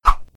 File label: water balloon throw